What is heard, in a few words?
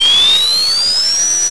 Zoom in; NASCAR Racers